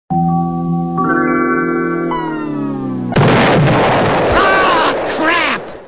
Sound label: startup